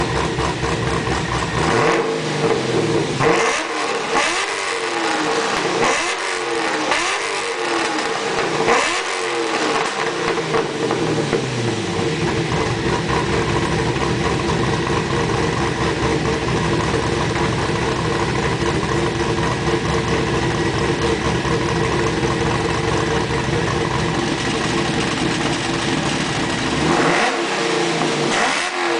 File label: cammed v8